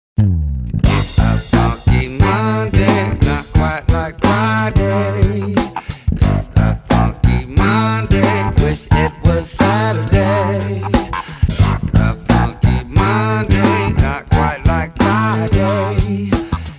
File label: jingle